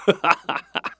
man

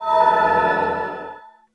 resist potion

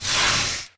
electric water

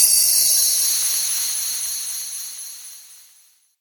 salt

